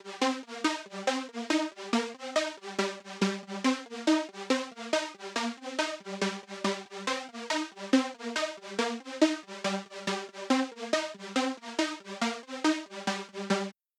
synth

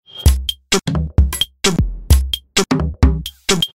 orig loop

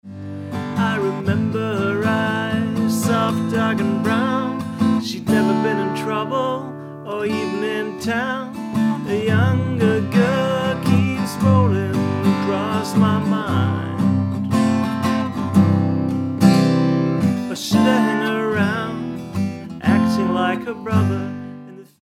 voc guit

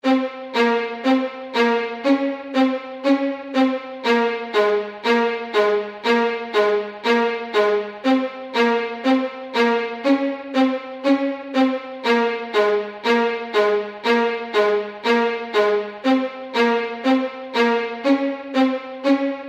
marcato, String Viola Spiccato

